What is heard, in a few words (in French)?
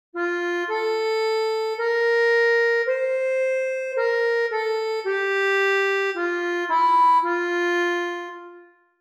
ACCORDEON; Psaume127